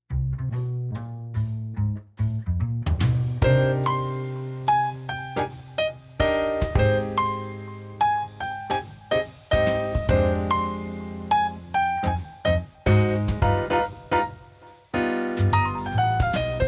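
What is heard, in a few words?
Landing BGM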